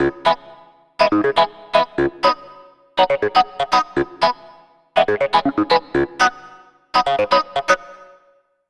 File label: Clavinet; Dr. Fink's Funk Factory